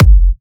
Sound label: Bassdrum